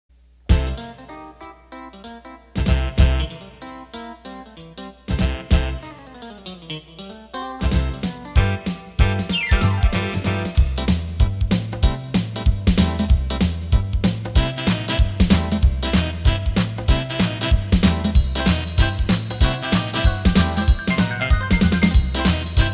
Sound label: Rock n Roll